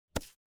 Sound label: retro game hit